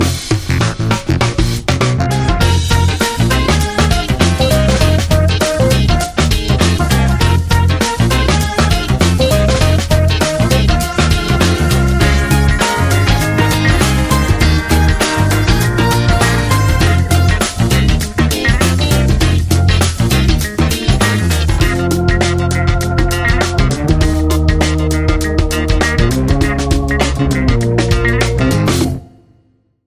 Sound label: Funk